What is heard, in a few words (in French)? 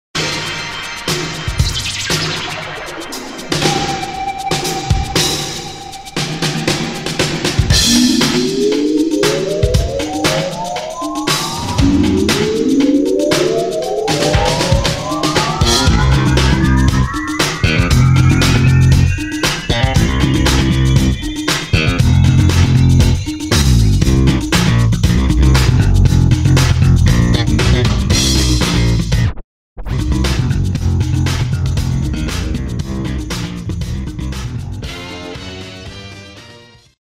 Funk Spatial Boogie